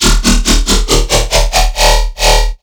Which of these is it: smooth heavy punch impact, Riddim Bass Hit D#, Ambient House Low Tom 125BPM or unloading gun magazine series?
Riddim Bass Hit D#